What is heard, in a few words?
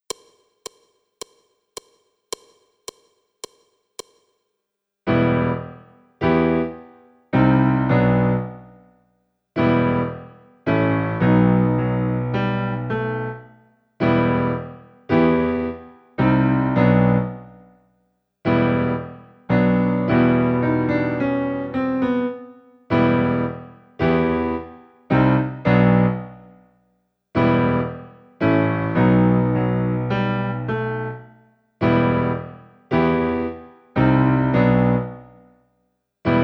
Cello